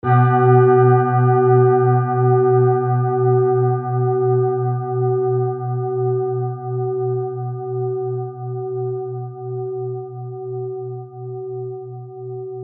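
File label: tibetan bowl sunset, B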